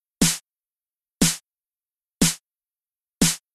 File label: Rhythm